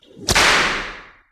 Babe Ass Smack; Monster Hunt

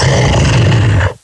Acquire; Pound

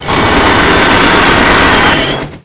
Pound Drill

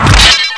Armor; TheDanes UT Projects; DanesSniperModMedia

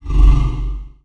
breath

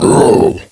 Bloat Attack; Bloat Santa

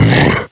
Attack; KF Christmas; Jack Frost

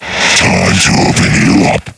Roar; KF Christmas; Scrake

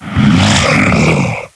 Scrake Attack

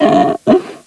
Stalker Attack

